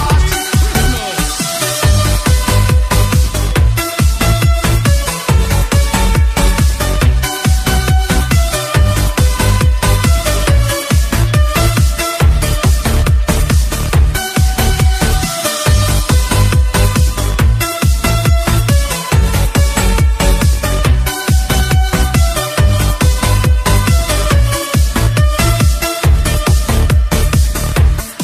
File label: Noel Remix New